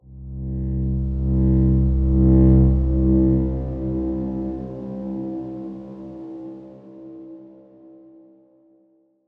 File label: Darkswarm C1 pp; HSS Ableton Pack1